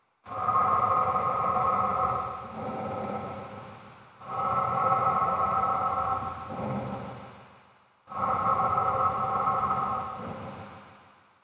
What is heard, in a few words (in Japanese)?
kemono iki; 怪; はーほーはーほー; 息ゆっくりスーハー 魔人・怪物; ホラーボイス・声ネタ・効果音・ＢＧＭ